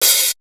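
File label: Hi-hat; Drum One Shots; Coldcut's Kleptomania VOL1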